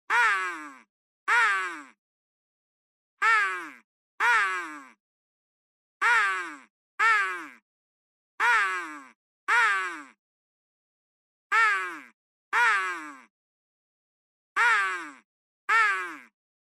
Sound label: Crow Synthetic